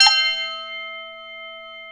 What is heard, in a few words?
BELL CRACK; Back In Time Records; Big Bang